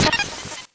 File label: Shield Reflect; mother2